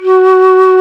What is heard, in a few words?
Flutes, Flt amb; Roland LCDP04 Orchestral Winds; 90 s Sample CDs; C Flutes 3-8